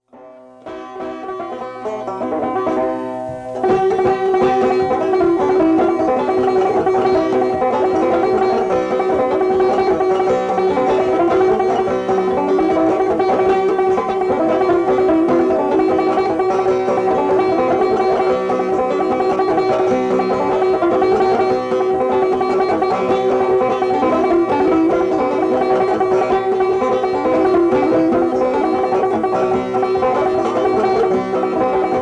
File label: banjo solo, KY; black eyed susie